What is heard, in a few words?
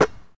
Street Ball Catch; SFX Kit